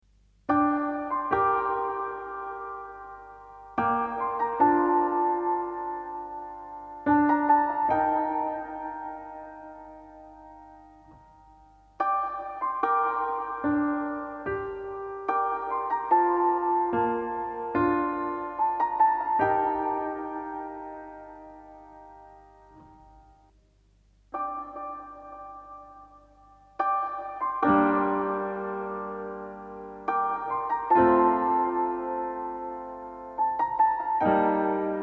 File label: PIANO